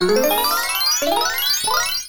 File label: pre nudge; spinsane; netent